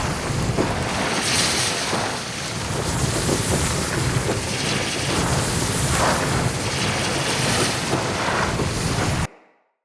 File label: Destroyed Fire Jet; Encounters; Ode Pollution Tower